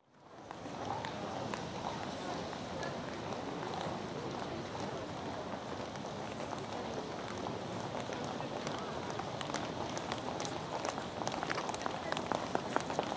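Rush Hour Footsteps, Exterior Atmosphere Ambience With People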